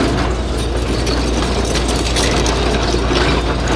move loop

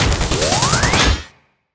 transform

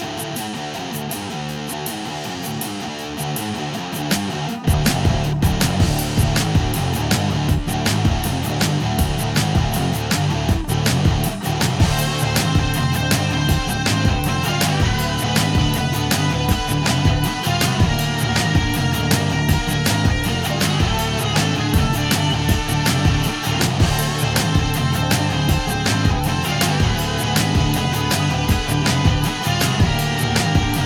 desert soft loop, music; bgm

